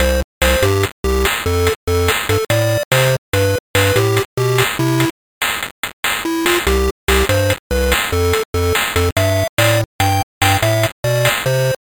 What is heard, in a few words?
video game music level